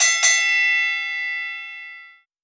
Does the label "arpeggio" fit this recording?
No